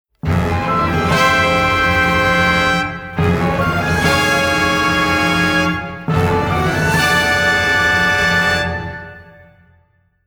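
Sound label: Magic Smoke Chords, instrumental tracks; Wizard of Oz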